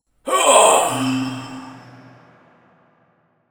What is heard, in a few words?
Infiltrator Death